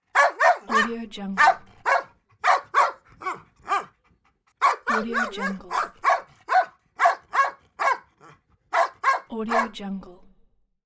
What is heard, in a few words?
dog barking; moonaudioproduction